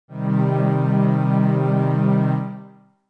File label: C Minor